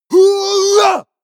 male combat attack long

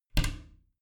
bathroom cabinet creak close regular; objekte; tueren

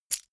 key ring large catch

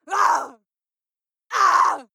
female lunatic scream pain short

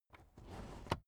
car headrest full adjustment soft

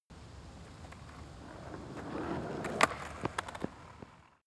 skateboard single drive fall off short; sport freizeit

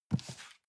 cardboard box medium grab slide soft; objekte

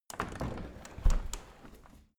window handle open tilt soft; objekte; fenster

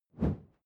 whoosh simple short airy low

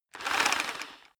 rock drill hammer rev short; maschinen; bohrer; soundpool

